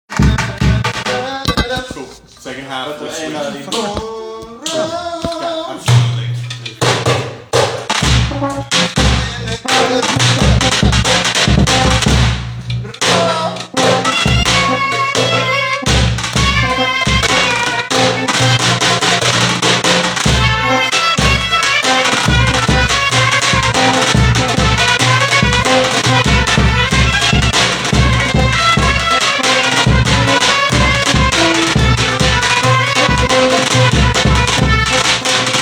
groove

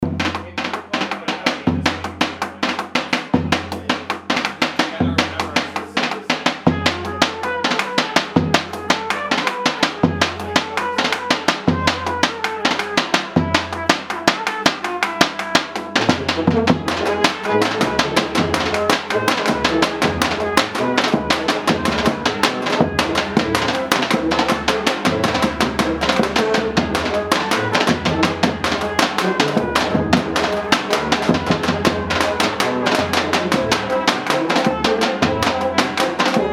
B drums rehearsal

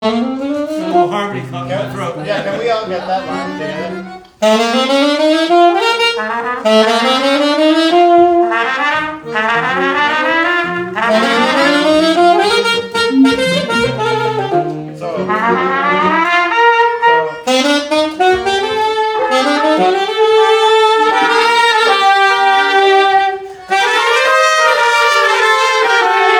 Techno harmonies, Rehearsal Recordings; Cocek

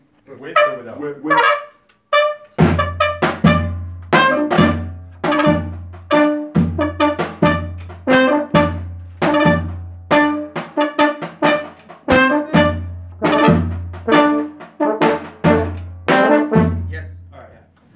Solo Mids

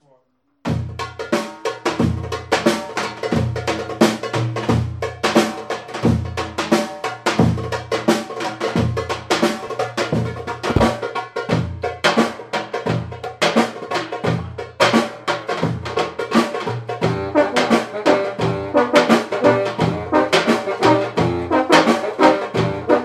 drums n rhythm, rehearsal

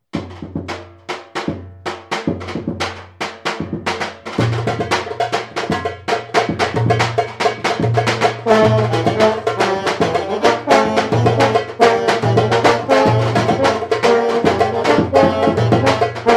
thailand shuffle rhythm rehearsal

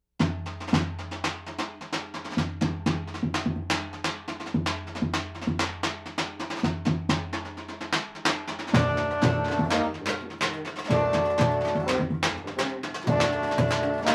clarinet mic

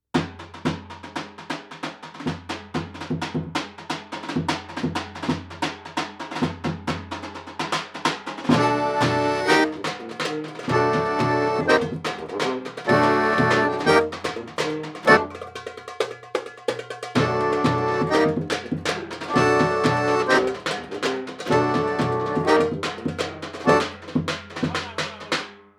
accordion mic

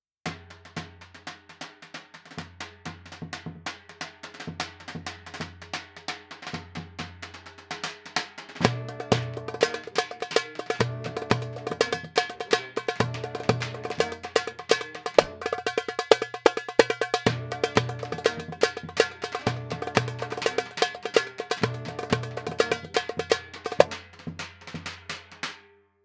dumbek

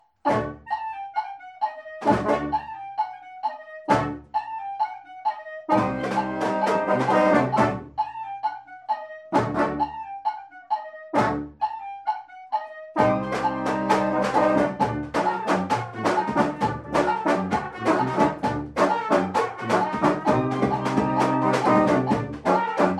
cocek rehearsal

